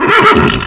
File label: HORSE